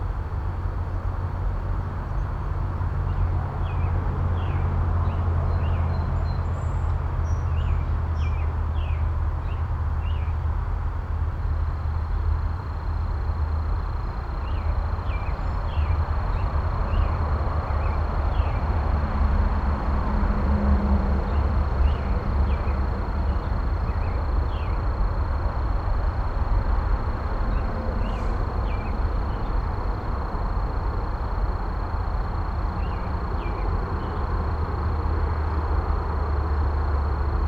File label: Urban park day bird insect traffic; urban and suburban; boom library